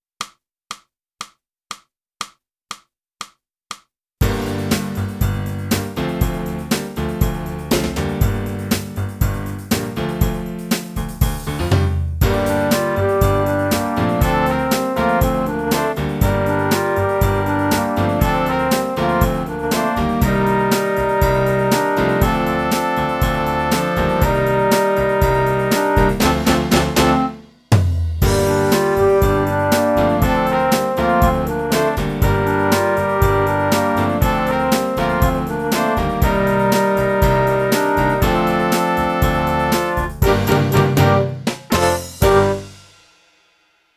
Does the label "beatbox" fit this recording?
No